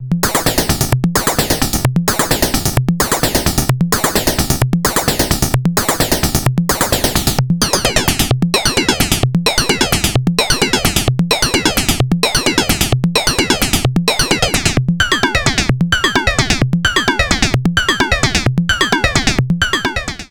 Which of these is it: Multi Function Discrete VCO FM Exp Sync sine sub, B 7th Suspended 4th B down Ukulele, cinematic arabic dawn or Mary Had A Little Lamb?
Multi Function Discrete VCO FM Exp Sync sine sub